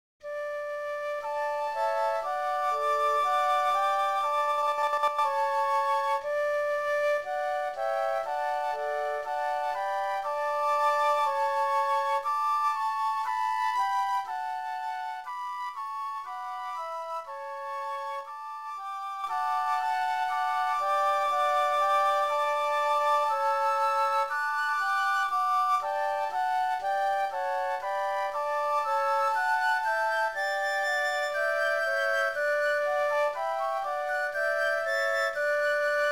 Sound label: Flute